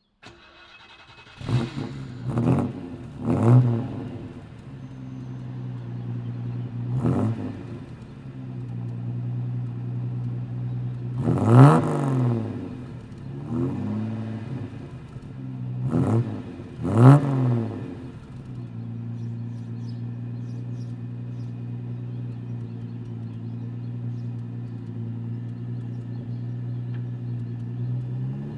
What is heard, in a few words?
Lancia B52 Vignale